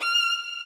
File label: solo